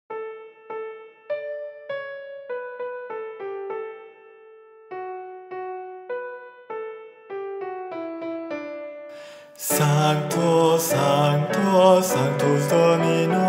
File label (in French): Sanctus Guide voix Altos; Missa Pro Europa